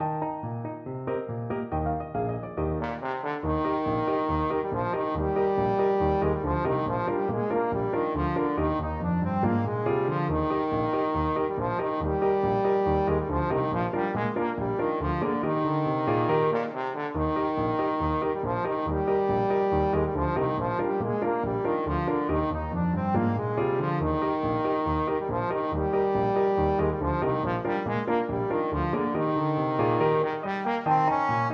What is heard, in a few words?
bulgar, trombone